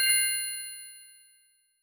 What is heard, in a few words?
confirm, tones; inteface sfx pack 1